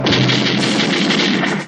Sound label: shots